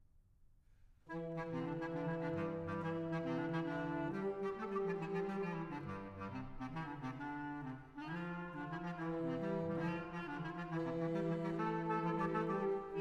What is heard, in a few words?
English Dance, band